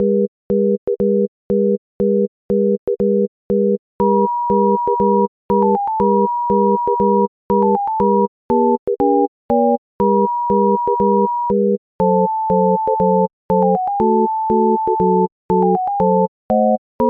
8edo

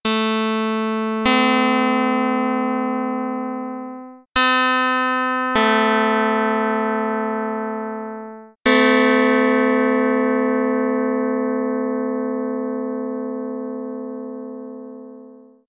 pluck; dr220

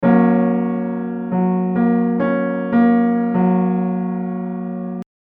Super triad

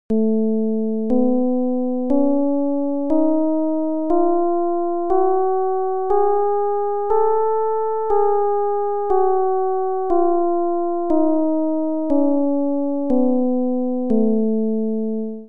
csound foscil 220hz

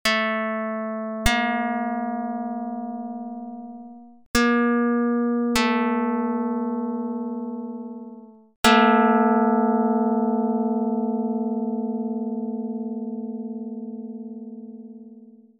pluck dr220

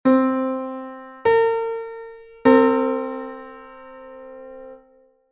sixth, seventh (5-EDO)

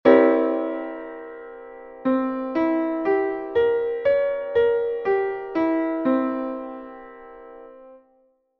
C7b9 (19-EDO)

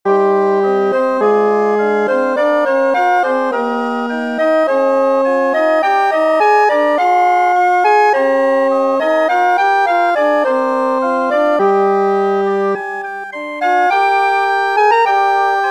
Blackdye Aeolian Example 22edo

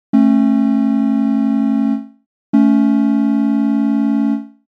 Comparison 23edo thirds

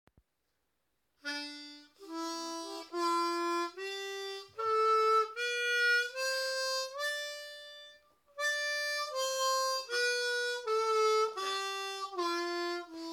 Harmonica Ushaq Masri

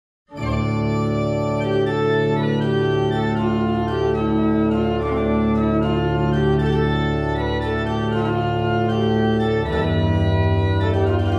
22edo Praeambulum

